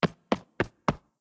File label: Run; blue archive